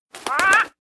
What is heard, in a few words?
Play Underlay; One Eyed Cheat